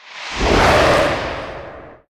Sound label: Poison Breath